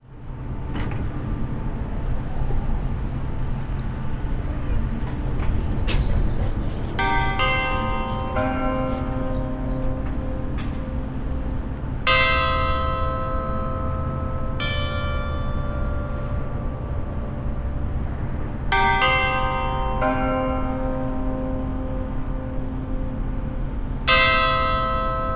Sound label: Law Courts